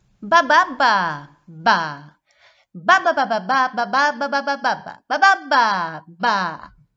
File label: beatbox